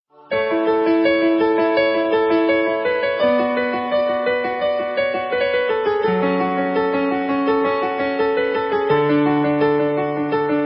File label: classical, piano ringtone